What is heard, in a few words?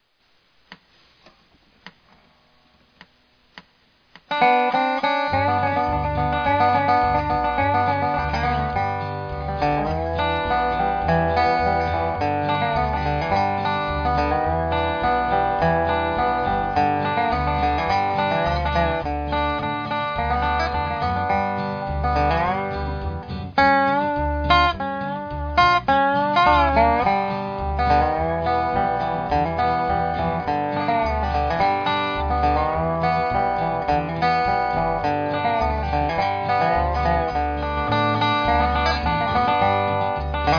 slow, dobro